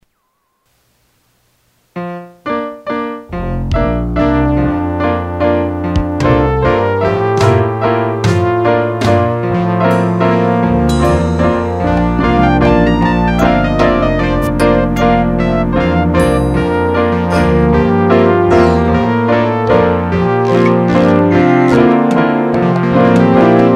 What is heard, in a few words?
Waltz Lead